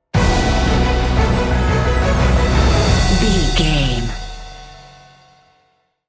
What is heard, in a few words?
epic cinematic sting bumper